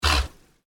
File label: Sea Otter sneeze; Seattle Aquarium